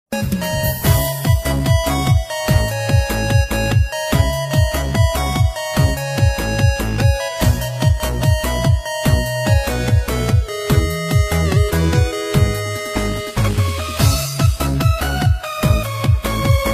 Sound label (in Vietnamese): Liên Khúc Nhạc Sống Remix Cực Bốc